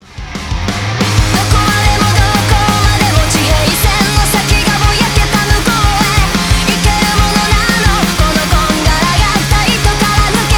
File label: fuzz